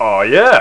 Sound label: Complete Picture; Crystal Crazy